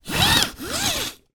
dog leash lead extendable retractable short pull then retract; animals